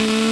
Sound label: Panic, Engine